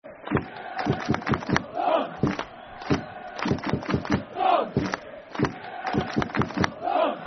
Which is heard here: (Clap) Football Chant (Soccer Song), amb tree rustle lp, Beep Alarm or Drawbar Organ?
(Clap) Football Chant (Soccer Song)